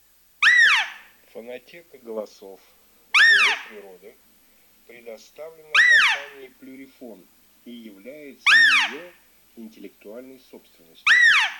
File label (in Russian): Косуля самка Capriolo; Capriolo f